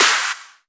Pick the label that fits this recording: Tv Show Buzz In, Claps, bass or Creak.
Claps